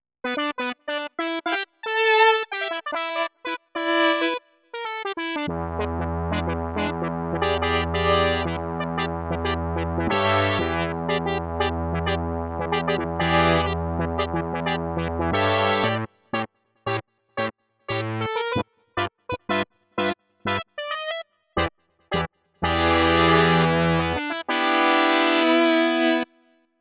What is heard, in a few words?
Comp That Funk